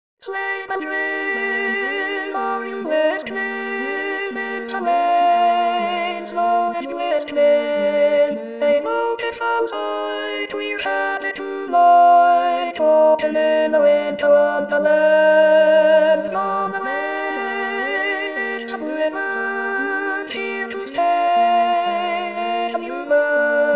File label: alto